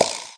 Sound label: fire small, paintgun; There; ThereClient